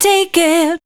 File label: voice spectral, ly short